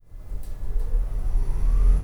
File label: elevator start up; OneShot Fading Memory